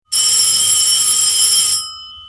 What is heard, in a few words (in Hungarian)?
effekt regi csenges